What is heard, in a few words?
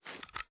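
pistol reload empty regrip; gmod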